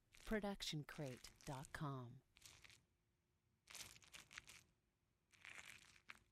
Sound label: squishy long; soundscrate